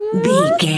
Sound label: voice fun small character emote interested